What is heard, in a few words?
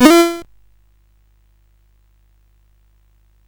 sid CSG8580R5